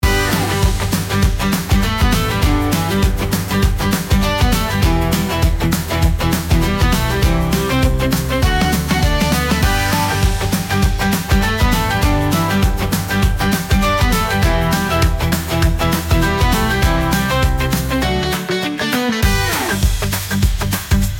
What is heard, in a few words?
100 bpm Surprise Fast Guitar Rhythm, instrumental; ai social media music